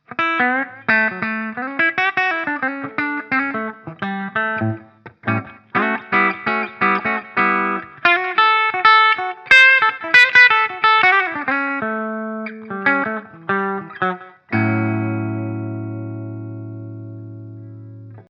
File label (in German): steg clean